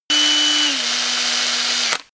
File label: drill classic switch rpm; maschinen; bohrer; soundpool